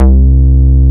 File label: BASS MONO